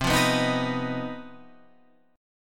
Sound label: C Minor Major 7th Double Flat 5th chord